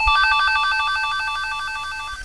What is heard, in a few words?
world key; mazeofgalious; jorito